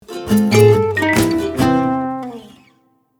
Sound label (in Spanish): AUDIOLOGO; MEZZALUNA